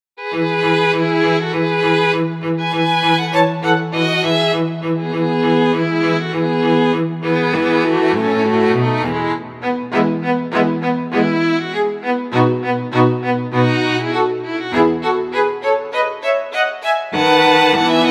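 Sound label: Haydn Quartet E major all voices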